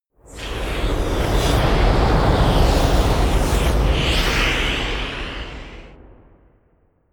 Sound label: whoosh air